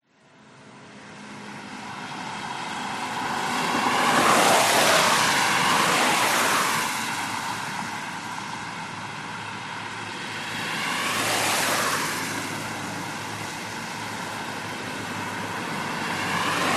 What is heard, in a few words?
traffic pass by wet road super highway, thailand chiangmai